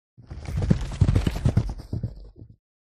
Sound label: By Horse Gallop Past